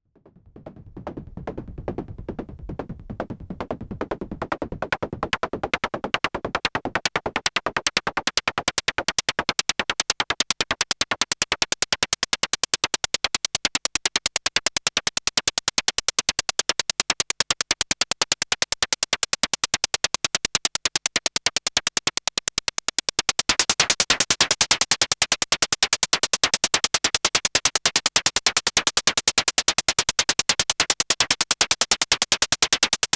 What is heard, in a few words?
Behringer Syncussion SY-1 sequenced percs